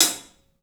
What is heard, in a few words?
HAT, CLUBHAT, 3D Drums; 3D Sound